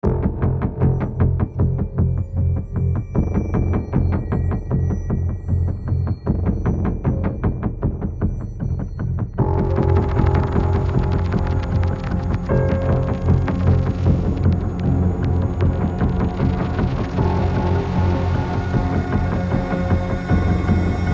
Dystopia 77bpm

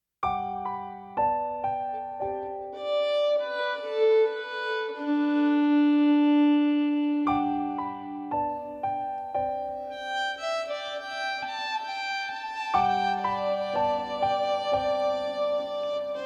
Family Lullaby

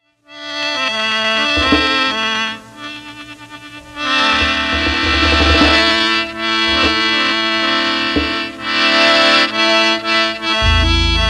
Kheneccordion

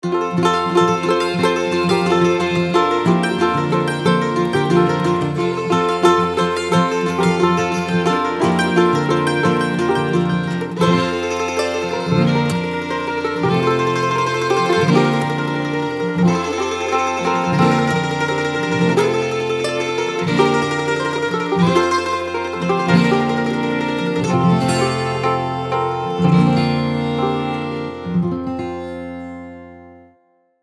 Folk; Angels We Have Heard On High

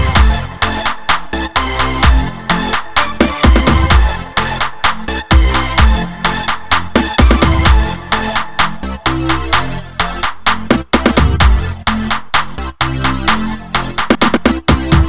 lets go instrumental

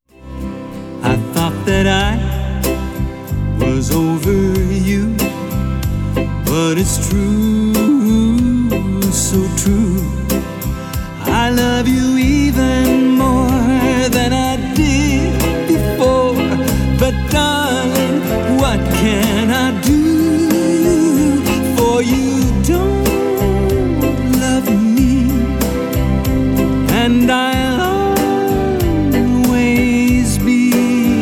CRYING

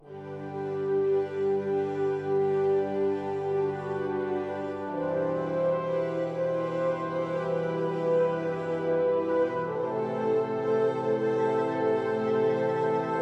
Orchestral Intro

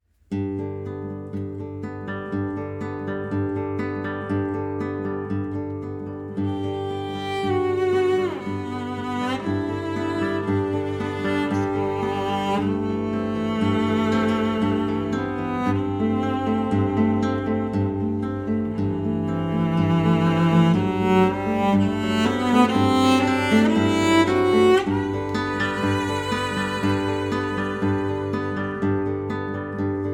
Vc Gt; Swan

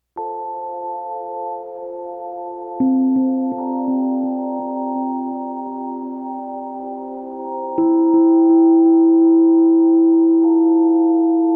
ambient

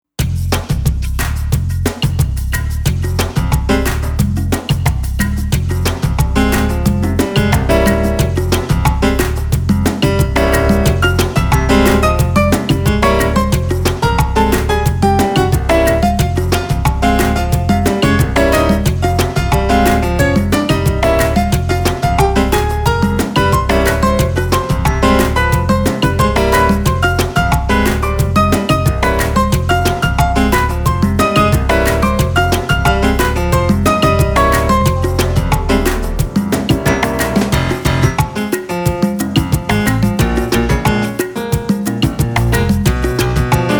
PIANO JAM INSTRUMENTAL

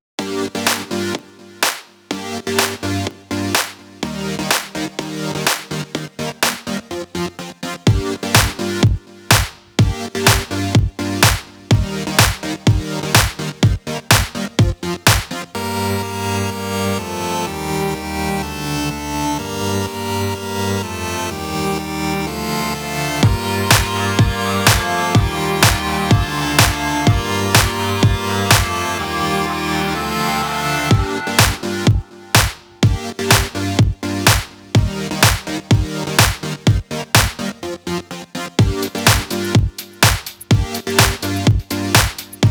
Serenade, BPM 125; Flytrap Productions

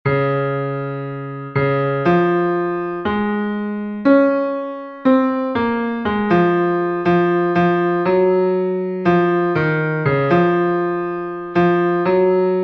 BASS; So We'll Go No More A-Roving

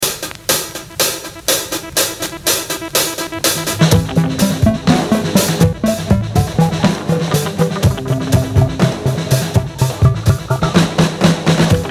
Stutter Filter

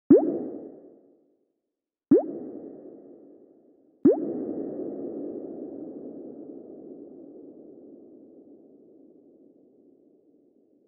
drops; Tiny Sounds

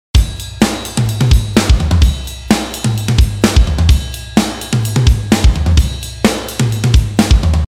128 POP PUNK LOOP RIDE TOM DINGERS

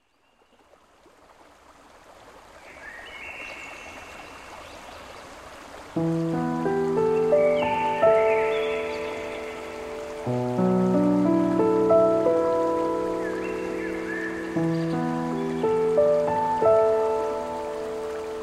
Birdsong

